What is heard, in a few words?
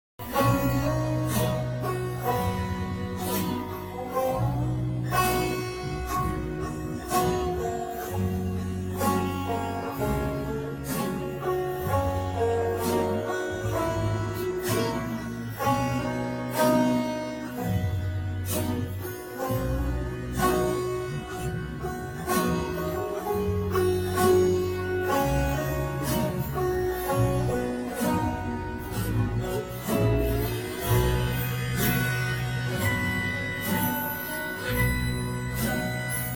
banjo